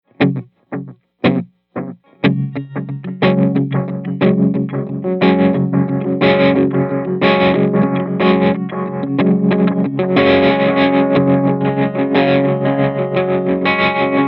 trem trip a little, tremolo; diamond pedals

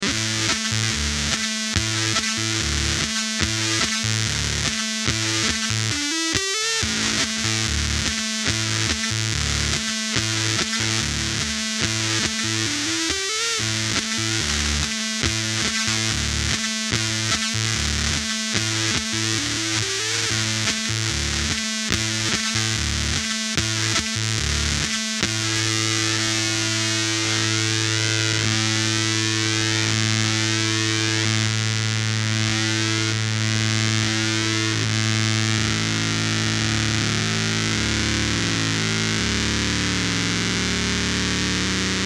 GUITAR POP, fuzz; devi ever usa; disasterfuzz

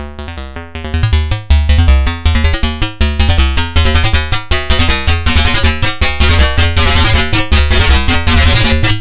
BBD Karplus Decay; doepfer a-188-1

